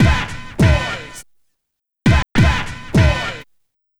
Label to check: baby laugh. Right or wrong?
Wrong